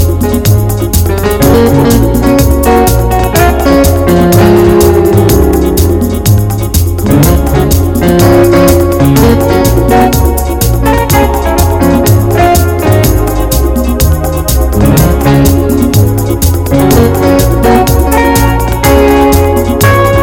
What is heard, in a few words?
Pretty and Hot Shuffle